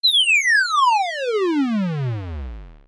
fall

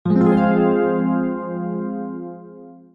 arpeggio

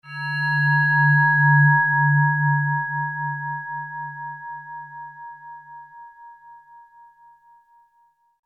horror

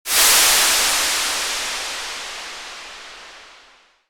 steam

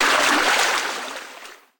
water

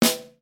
snare

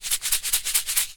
shake

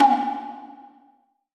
hand drum

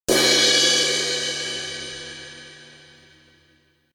cym

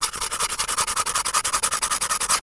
scrape

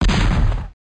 gun fire

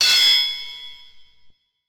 swords

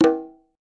button

